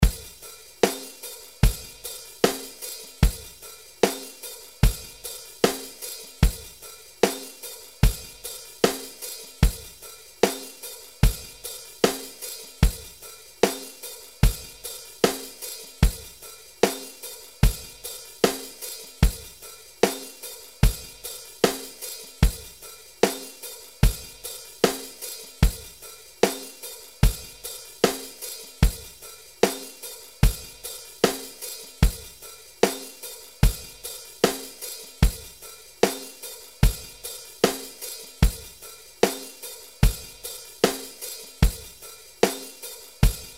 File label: 75BPM